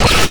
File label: grenade; rumble skin03